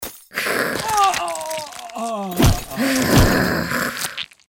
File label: Witch Blood Suck; Goddess of Trampling